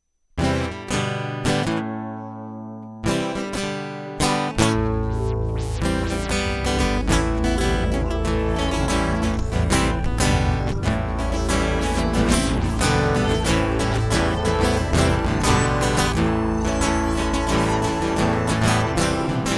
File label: Instrumental